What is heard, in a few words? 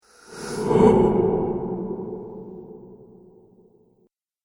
Possessed Ghost Spirit; Ghost Sound Effects; Haunted House Or Graveyard